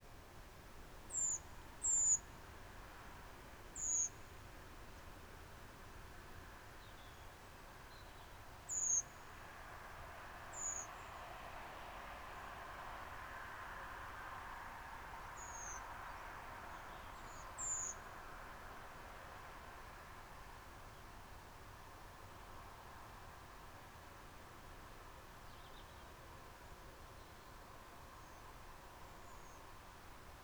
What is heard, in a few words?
Redwing Turdus iliacus, NFC, Night flight calls; Poole, Dorset, England, 03:41, 30 October 2016; Paul Morton